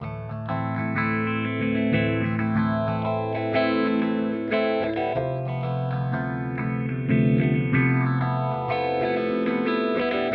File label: HX Stomp Modulation Phaser; Vergleichstest